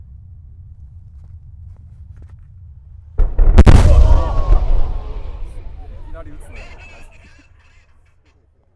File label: military attack